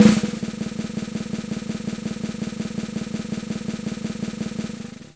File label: drums roll